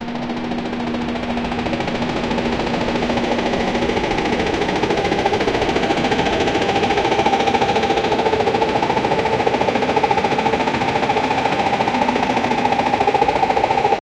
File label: sound of a helicopter engine